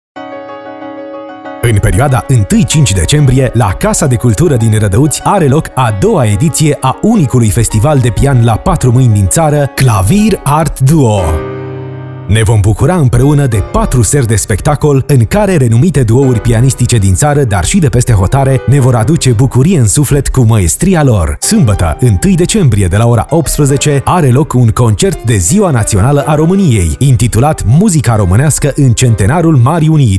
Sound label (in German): KLAVIER ART DUO